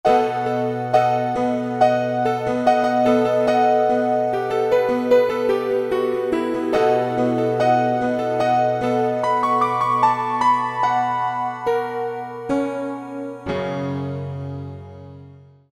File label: Yamaha TG33